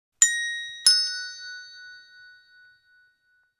Classic doorbell ding dong

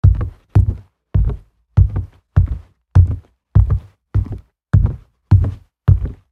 Footsteps on wooden floor boots fast walk

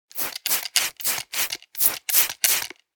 Barber shop spray bottle spraying

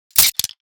Futuristic gun firing mode switch

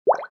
Water bubble pop

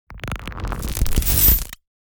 Energy flow fast particles movement

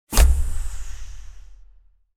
Futuristic door air pressure build and release

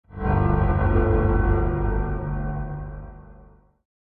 Spooky piano strings

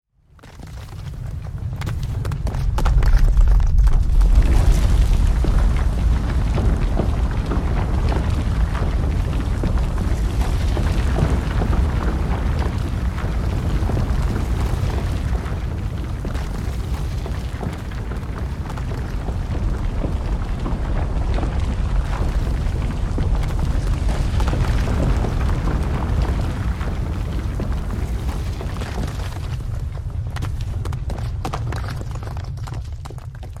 Earthquake ground earth heavy large falling rock

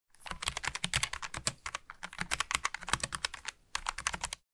Logitech keyboard typing

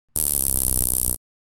Taser buzzing close perspective

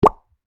Bar chart pop in animation infographics

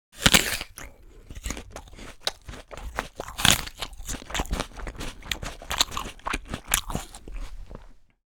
Apple eating fruit chewing

